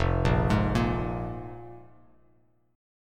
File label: F Minor Double Flat 5th, F, Bass Standard; Fmbb5 arpeggiated; JGuitar